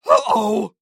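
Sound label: funny "oou"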